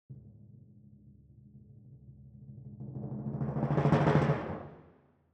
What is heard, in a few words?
Timp Close